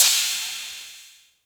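crash cymbal sound C key, Royality free drum crash sound tuned to the C note. Loudest frequency: 6273Hz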